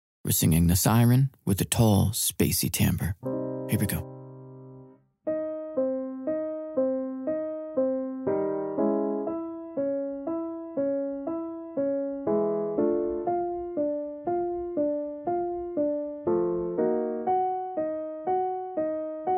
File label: Vocal System Siren Exercise